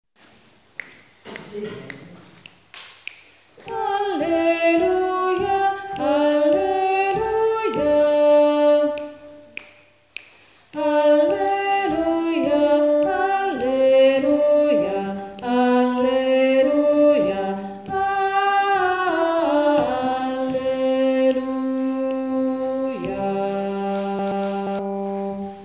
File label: alleluia bassi; hassler